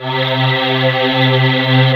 AIR VOX C2, VOICE; AKAI S-Series CD-ROM Sound Library VOL-7

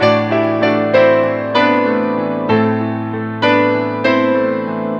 A Piano; Sonic Foundry (Sony Creative Software); Ugly Remnants VOL-2

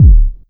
125 DRM, Fresh Disco House; USB Soundscan vol.20; AKAI

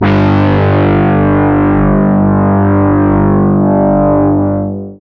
GUI CR G, E DISTORTION, Metal Mix; Sound & Vision; Gigapack I CD 2

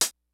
DANCE KIT, DRUMS; Kid Nepro; Elite

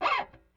Rickenbacker Bass; Airwindows

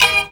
TANBURA HITS; Best Service; World Colours

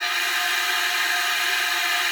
alto sax C#6; Xsample VOL-2

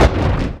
PERC FX, Dance Drums

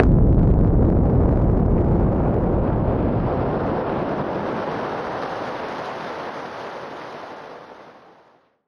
Noise Bomb Harmon; musicradar; bomb factory

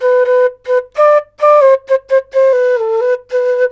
SERULING, Heart of Asia

